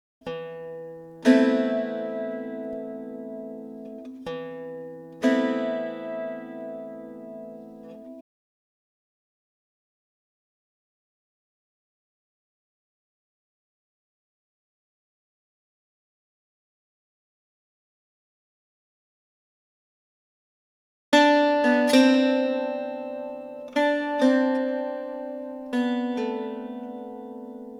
Em 120 Flavourtones LYRE dry

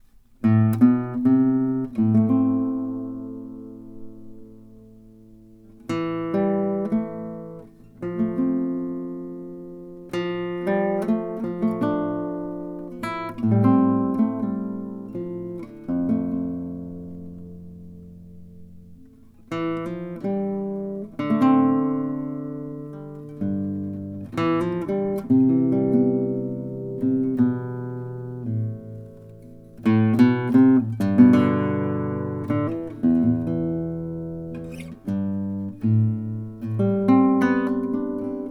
Am90 Guitar

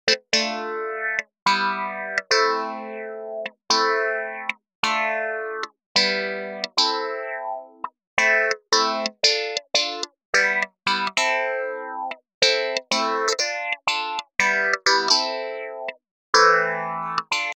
Synth

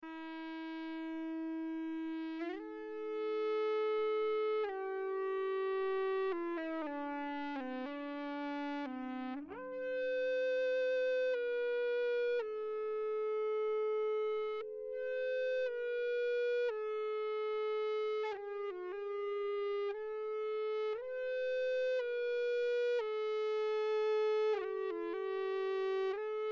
Ebow; Mille Regretz

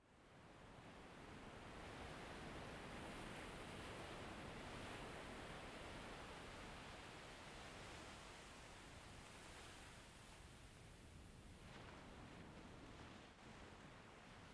Waves; FreePalestine

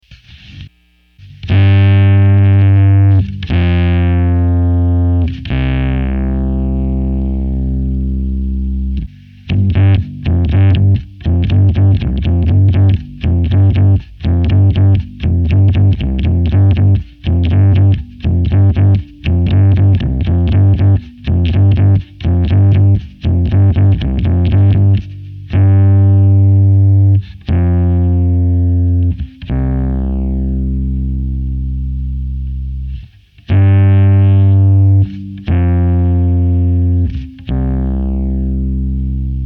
Bass Distorted

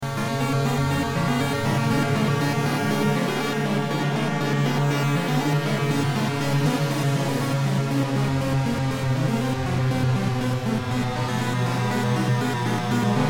Sequenced Siren

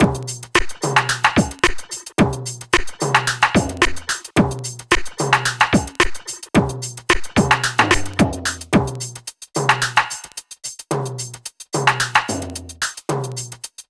Beats

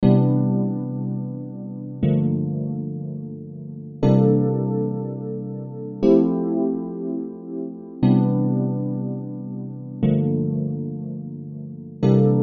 Dream Rhodes

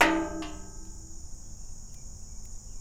perc

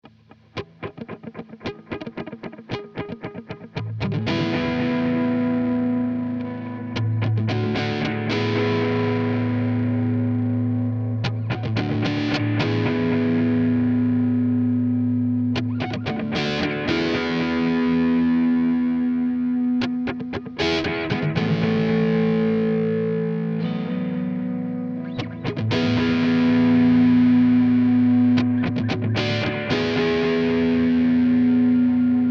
E 112 GTR Riff Trashed

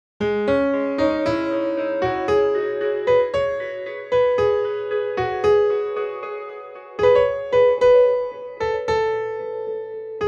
piano epic

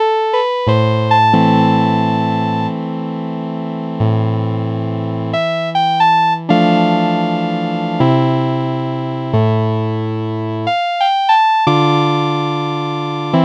Am90 Chiaroscuro Chords and Melody

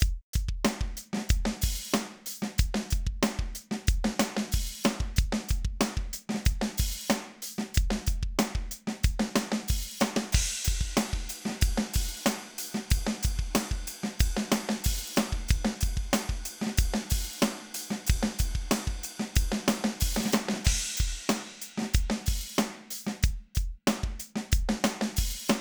Drum Track

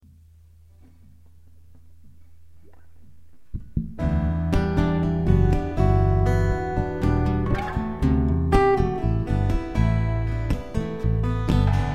Acoustic Jam in E Minor

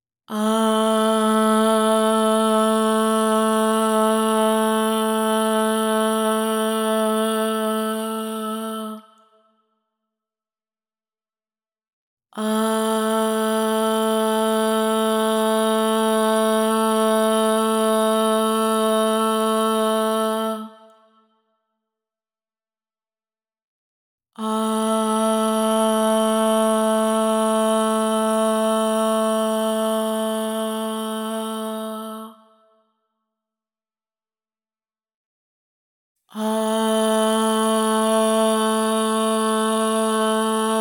Ahh 432 hz Reverb; MixterPlus

